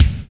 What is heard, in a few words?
clicky kick d sharp key, Loudest frequency: 998Hz; kick drum samples; one shots